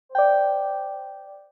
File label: Alert nudge; Microsoft Windows-10-Mobile